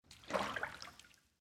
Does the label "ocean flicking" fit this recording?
Yes